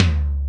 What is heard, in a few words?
DRY TOM; E-MU Formula 4000 Series Vol. 3; Analog Odyssey; VK Instruments